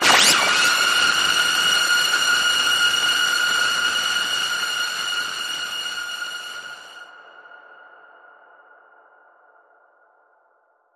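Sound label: Blast Ring Fade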